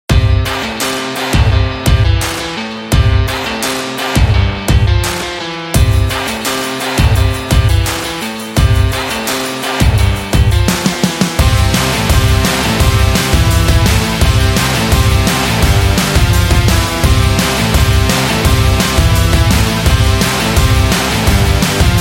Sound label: Happy Indie Rock